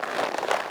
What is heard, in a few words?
dodge soft; characters; Jump